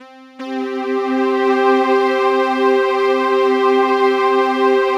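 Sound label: PAD, atmospheric pads; Best Service; XX Large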